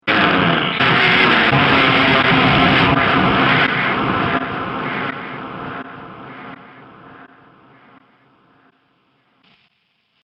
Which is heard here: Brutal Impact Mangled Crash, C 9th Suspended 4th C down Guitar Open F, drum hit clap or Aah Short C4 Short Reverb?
Brutal Impact Mangled Crash